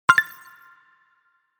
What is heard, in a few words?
Button positive notification